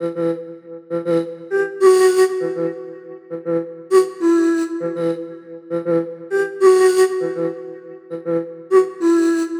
Flute 100BPM Emin; Gasherbrum; 99Sounds x Monosounds; Instrument Loops

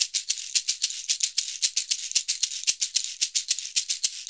SHK, Shaker; Univers Sons; Basicussions

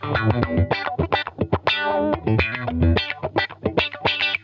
Funk Guitar; 90 s Sample CDs; Zero G

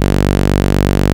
2600 G2, ARP 2600; Hollywood Edge - Giorgio Moroder Rare Synthesizer Collection

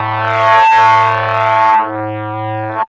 BLURP LO, Hard & Loud Techno; USB Soundscan vol.01; AKAI

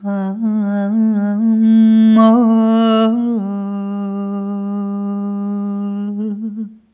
Rhythmic G

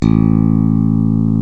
FACTOR BASS A; Greytsounds

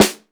SD DRY3, Korg Electribe EMX-1

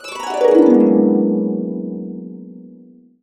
Harps; USB Soundscan vol.62 - Complete Harps; AKAI

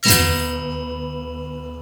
GAMLAN, ASIAN PERCS; Crypton - DrumBase5000

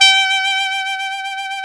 C5 sax, soft sax; East West - Global Sounds

